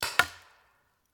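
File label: Paintball Gun Impact; Sound Ideas; Guns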